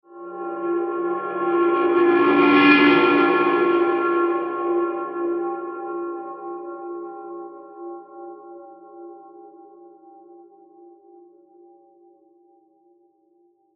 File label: Long Mysterious Metal Transition, scary soundscape; Horror; Crafted Signals